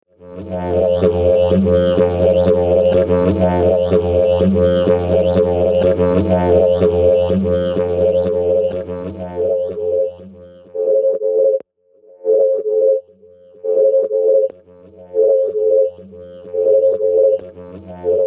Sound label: Pattern Harmonic Wobble, didgeridoo